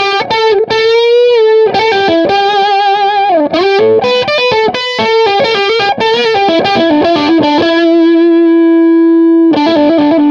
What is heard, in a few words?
ROYER 101; studio